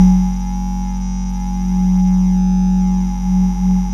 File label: SYN KLACK, Microwave; Sound & Vision - Gigapack I CD 2